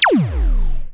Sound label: Laser Classic Shot